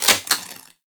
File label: Mech; Weapons; Warframe; TheNewWar; Omicrus